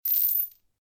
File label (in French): jetons